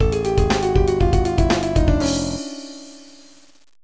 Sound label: Defeat; Omalone